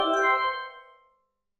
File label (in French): feedback final